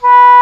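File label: English Horn mf B3; Digital Sound Factory Virtuoso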